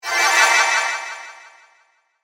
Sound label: Magical Whoosh, Production, Element, Magical, Whoosh, Delay; Shimmer; BlastWave